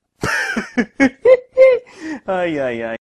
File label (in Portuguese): melhor risada; brksedu